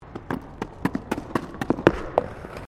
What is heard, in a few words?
Ice skating Toe Run, Figure skating; Ice Skating (Sound Effects:Sports)